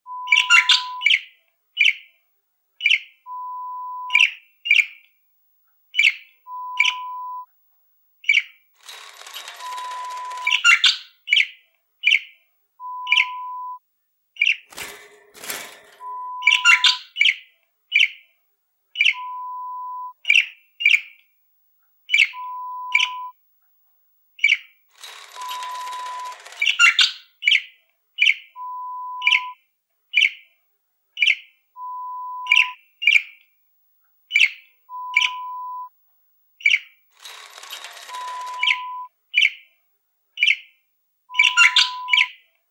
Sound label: Budgie in cage